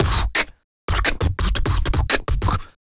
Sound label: Human Beat Box, Drum & Bass; Loopmasters